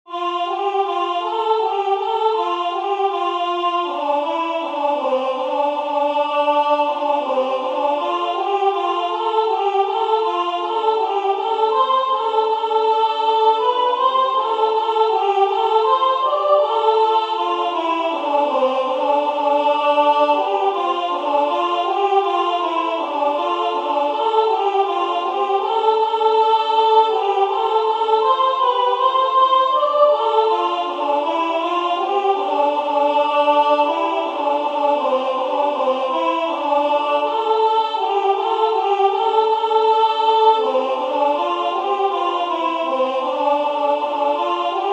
O Virgo splendens femmes; Livre Vermeil

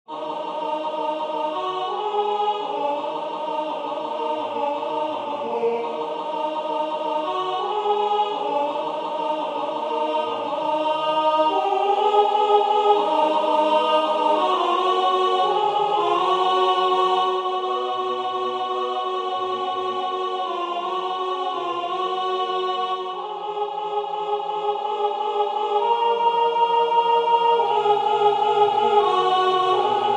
alto; Accourez choeurs angeliques

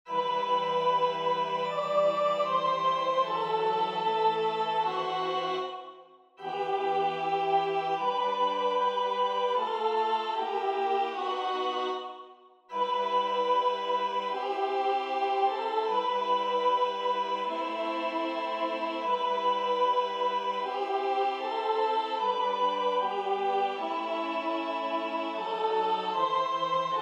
soprane; Ave Maria; Franck

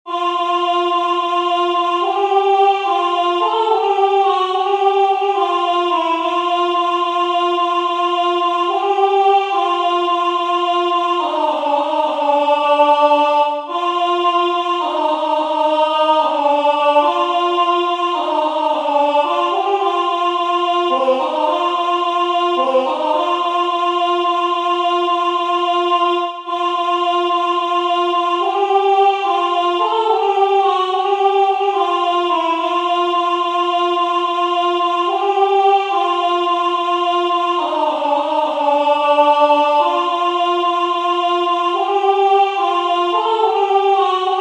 Venez divin Messie alto

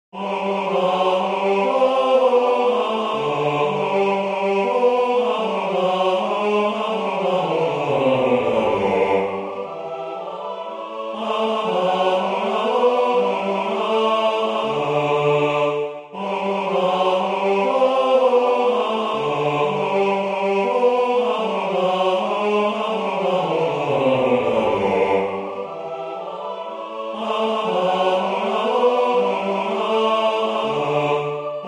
Jubilate Deo basse; Mozart

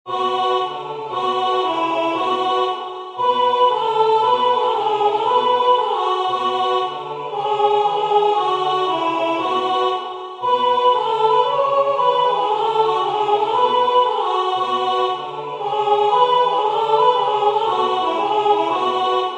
Alle psallite cum luia voix II

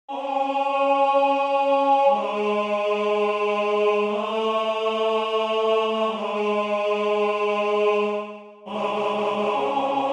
Ecce Homo soliste et quintus

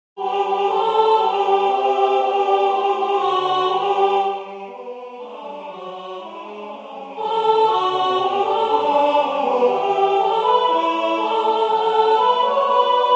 Jubilate Deo alto; Mozart

